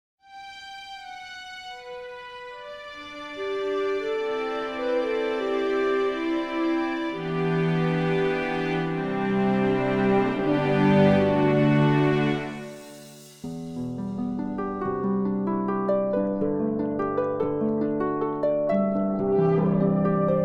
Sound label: Menu Clasic; heart of eternity